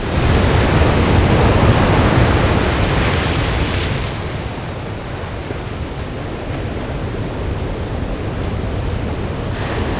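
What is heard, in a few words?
ocean wave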